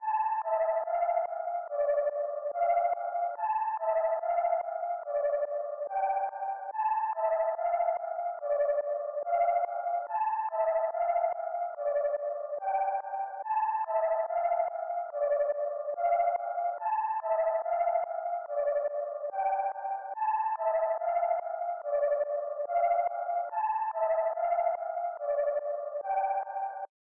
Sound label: 143 BPM D Min Lead, Loop Stems; Cymatics; 2022 Melody USB Expansion; Chaser